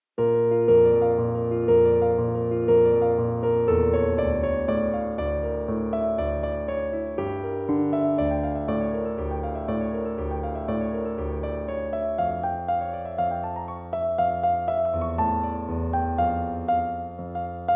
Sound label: Piano